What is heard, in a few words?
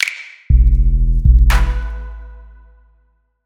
Beach beat